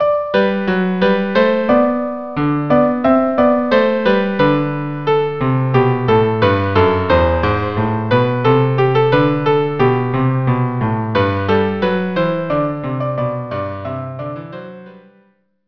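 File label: violin cello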